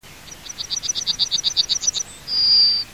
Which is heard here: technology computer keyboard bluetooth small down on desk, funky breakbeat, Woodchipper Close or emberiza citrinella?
emberiza citrinella